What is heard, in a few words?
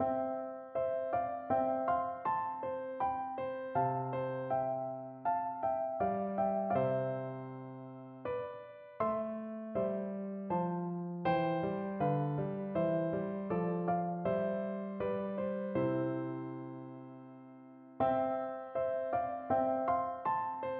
dance piano; gluck